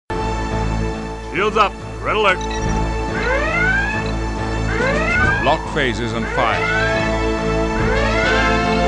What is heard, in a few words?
Red Alert; TNG; Riker